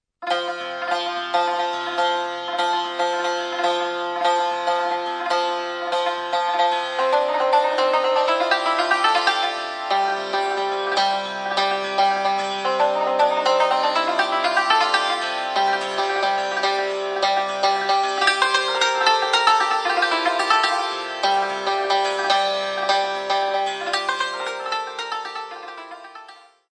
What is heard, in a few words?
Chand Mezrab Panjgah Rast Panjgah